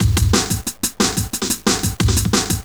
severe 180bpm

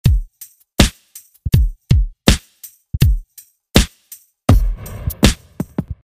6 Drums Sounds

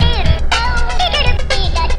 DeDaDoo Loop

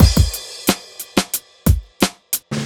90 groove; funk loops

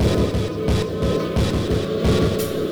steelworks

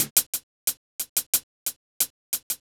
90 groove; funk loops

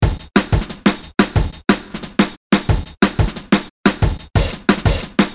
dnb 180

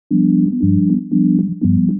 looped synth

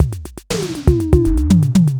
120 electronica; drum loops

